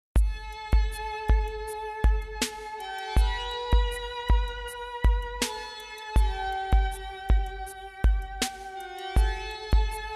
spa music 432 Hz; planeta ziemia

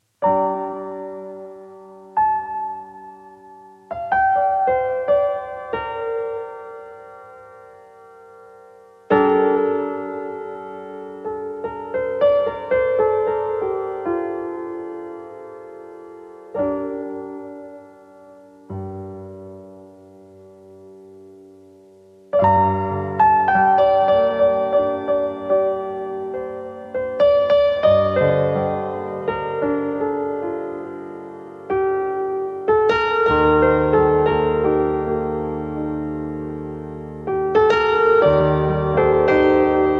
piano music, 432 hz; NA GRANICY MARZEN

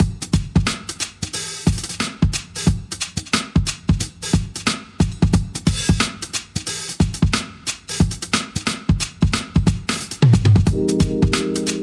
Groovy Chill Funk

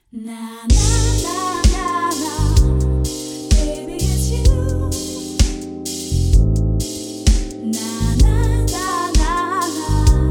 Slow Funk Vibes; Funky Embrace

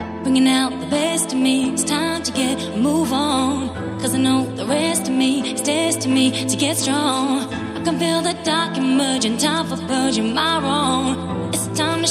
happy music 432 HZ

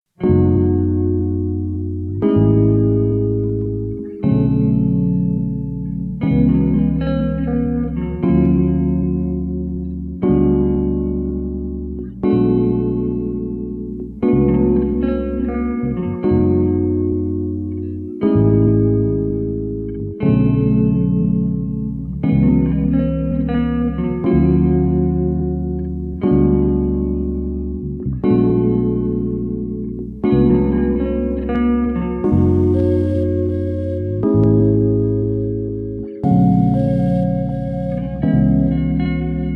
guitar chill 432 hz

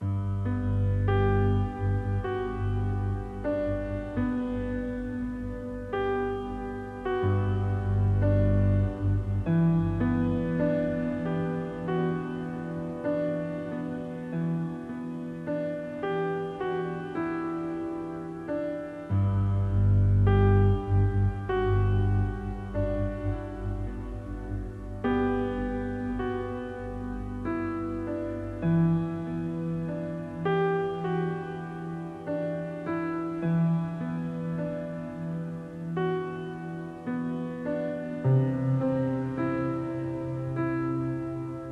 Soothing Harmony

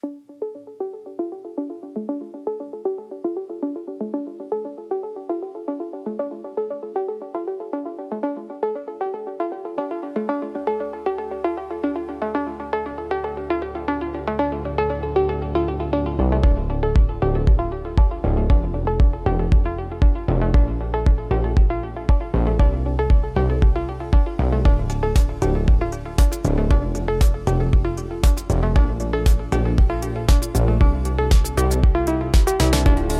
Electronic Impulse

